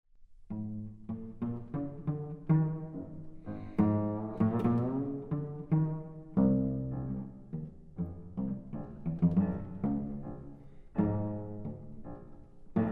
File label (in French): sonate pour violoncelle et piano, serenade moderement anime; debussy